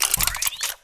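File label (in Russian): выдвижение лазерного прицела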